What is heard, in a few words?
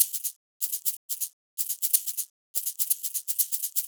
drum loop chop shaker 124bpm